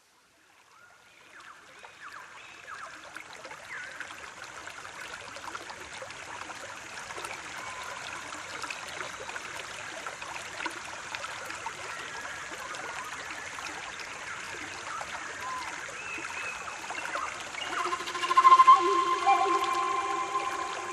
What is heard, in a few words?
indian relaxation 432 HZ